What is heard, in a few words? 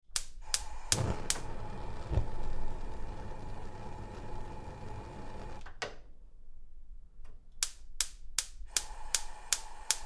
Gas Cooker Spark and Flame, Igniting gas hob; Various sparks gas and flames; Appliances; Tom Hutchings